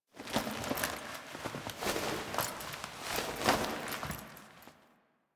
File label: Cloth Movement; Warframe; Quests; The New War; Drifter; Foley